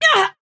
pain small

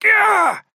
effort melee big; Trapper

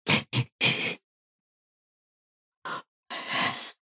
pain low health warning

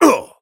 pain big

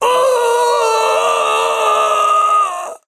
pain laser; Trapper; akira

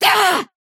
pain big

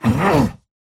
effort general; Slork

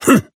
effort melee small; Operative

